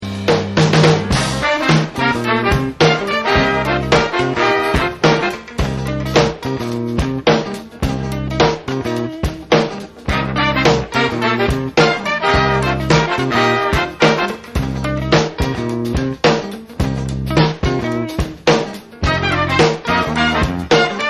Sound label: Dance Band, orchestra